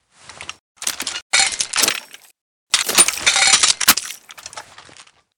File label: reload tactical; tacz; RFP v1.0.0 beta2